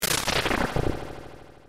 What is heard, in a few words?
boss appearing escaping; ff3; ff3us